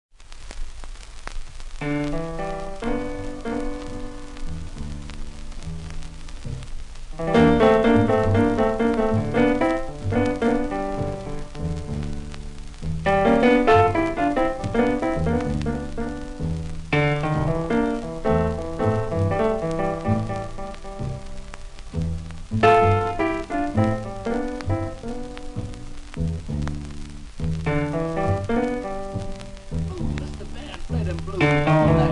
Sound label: Blues 78 raw